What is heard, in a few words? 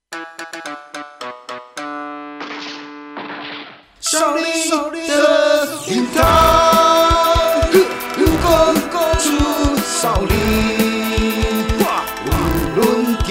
Ring Tone; 少林得英堂